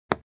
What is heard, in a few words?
Ping pong ball bouncing